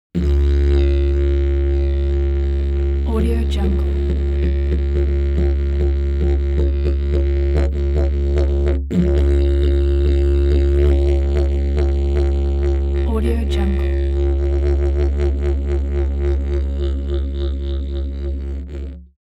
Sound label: musical sequence didgeridoo single tone sequence long